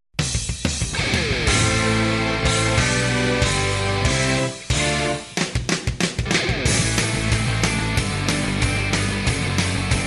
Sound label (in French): musique generique instru alternatif